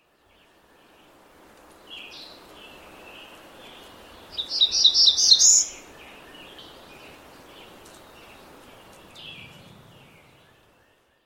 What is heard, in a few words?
Black-throated Blue Warbler Setophaga caerulescens cairnsi